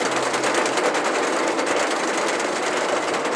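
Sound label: hybrid rc lift, roller coaster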